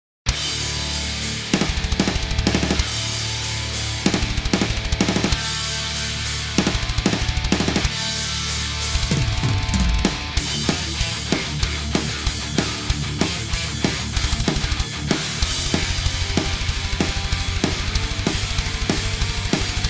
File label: guitar cube, metal track